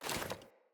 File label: Foley Run Default, Default Clothing; Player